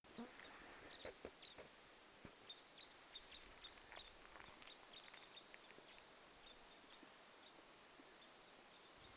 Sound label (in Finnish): ensimmainen ilm pikkukapylintuparvi